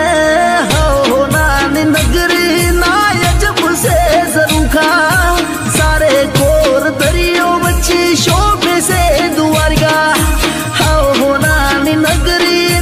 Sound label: Gujarati Ringtone